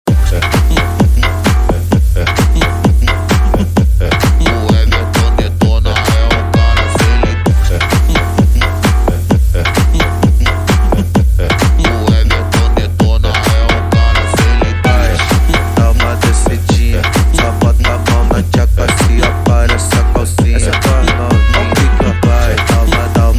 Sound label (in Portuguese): eletrofunk; som automotivo